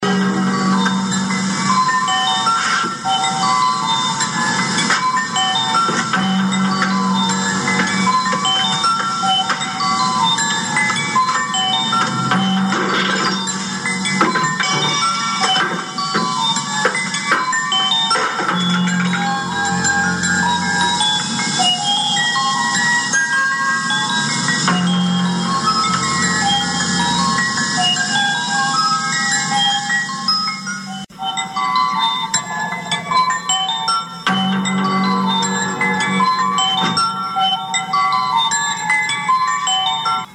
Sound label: dramatic theme